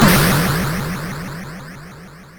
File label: Trance, MOS6581R4; Trance Sector Ultimate